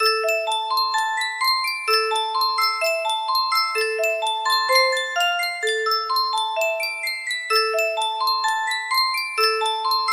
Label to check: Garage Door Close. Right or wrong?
Wrong